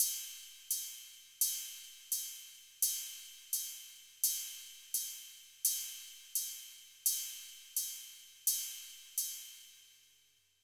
Drum Loop - 170 BPM Ride; Cymatics; Anthem Drum Loop

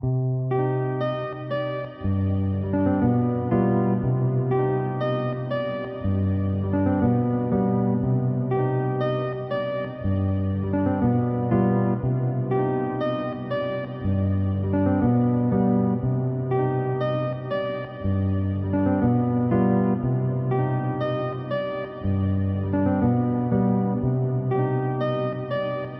120 BPM C Min Guitar; Cymatics; Combat Zone; 2022 Melody Collection Platinum Expansion

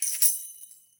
Tambourine Large; World Percussion; Tambourine Large 2; Cymatics; Emperor World Percussion

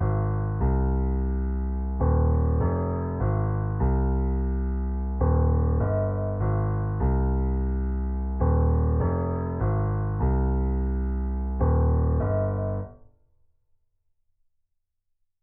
Piano, 150 BPM C Min; Loop Stems; Infinity Melody Collection; Cymatics - Float

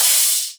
Ride; Cymatics; House Starter Pack; Cymbals

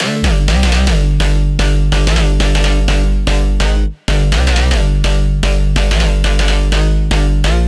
House Drop Loop, Future House, 125 BPM G Min; Synths

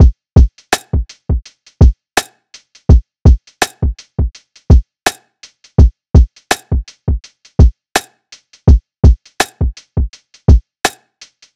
Manual Drum Loop 83 BPM, Lofi; Cymatics; ANALOG EVOLUTION Beta

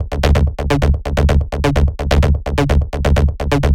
Progressive Beach Bassline Blur D 128 BPM; Loops

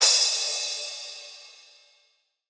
Crash, Cymbals

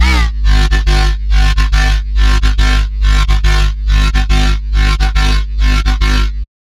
Bassline C3 140, Dubstep; GhostHack

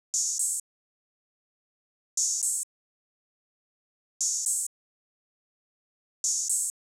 Drum Loop 118 BPM Open Hat; Drum Loops; Loop Stems; Cymatics; Nueva Drum Loop

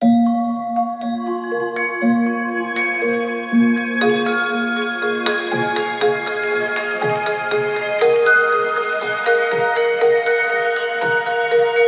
Celesta - Bbminor 60BPM; STEMS; Ghosthack; SkyRealm; Mood Kits; Mood Kit 07 - All Is Not Lost

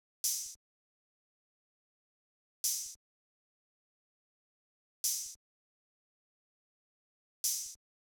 Drum Loop 100 BPM Open Hat, Hip Hop; Drum Loops; Loop Stems; Cymatics; No Se Nada

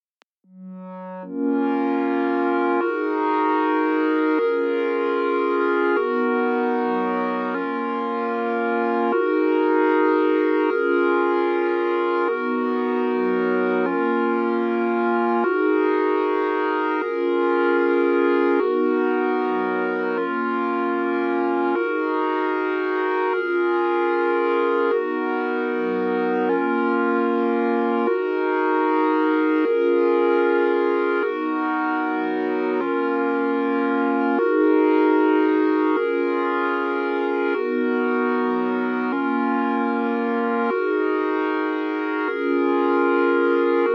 152 BPM D# Min Pad; Loop Stems; Cymatics; Mosaic

